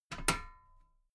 metal ladder step regular clank

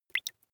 dripping single

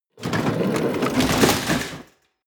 ambulance stretcher out

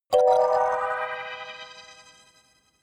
notification kalimba magical minor high

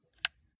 console controller joystick move single

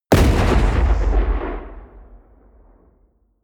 missile medium explode close

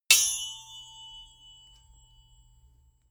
sword hit sweetener

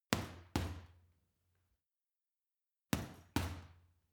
boxing punching bag uppercut double medium

